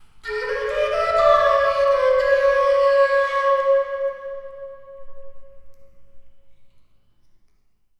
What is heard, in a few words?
Chasm Flute; Sonic Foundry (Sony Creative Software); Ma Ja Le Ethereal Textures; Flutes